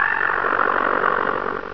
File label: attack; svencoop; keen